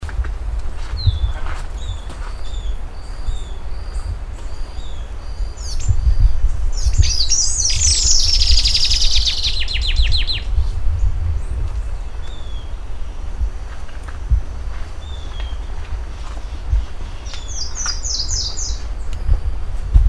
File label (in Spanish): atlapetes semirrufo atlapetes semirufus